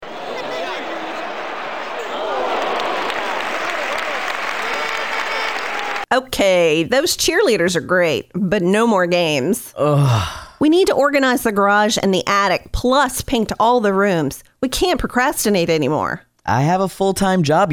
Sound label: Cheering; Concierge